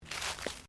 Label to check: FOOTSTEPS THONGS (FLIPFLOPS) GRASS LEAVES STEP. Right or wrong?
Right